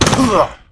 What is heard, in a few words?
fall, crusader; scourge